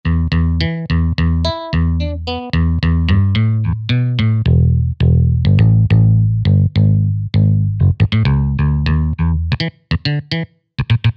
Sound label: COSM Bass